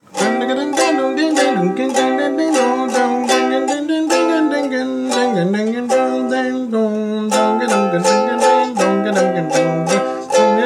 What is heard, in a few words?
ukulele ding dong